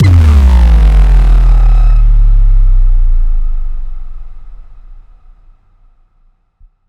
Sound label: Sreech Drop